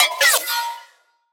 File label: Synth